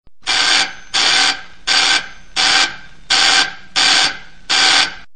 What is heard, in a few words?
Buzzer - Door Old